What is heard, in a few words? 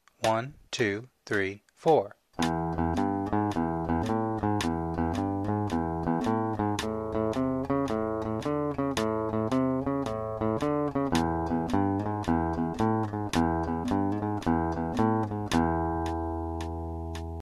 lead swing, guitar, "swing feel" or "shuffle feel"; Listen to how it sets a completely different groove.